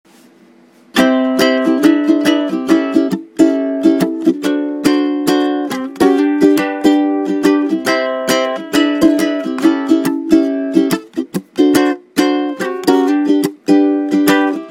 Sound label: chord progression